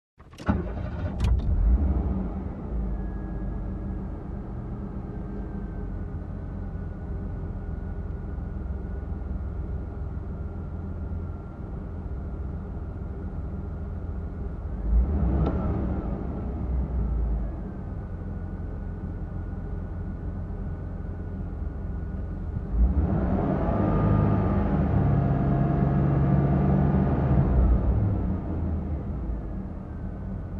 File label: Driving in a Truck from interior